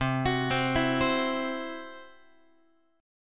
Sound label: C-Major Flat 5th, Guitar; arpeggiated